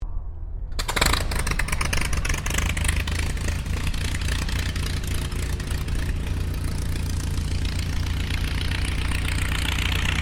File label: Aircraft Dehavilland Tigermoth Startup Idle, RAAF Tigermoth biplane training aircraft